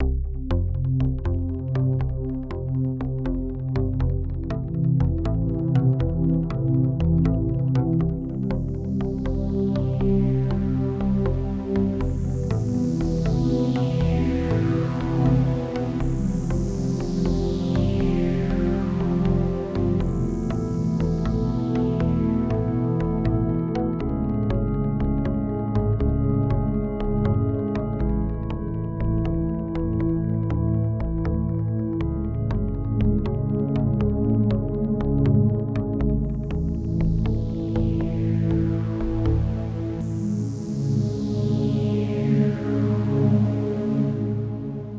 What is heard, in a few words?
Soothing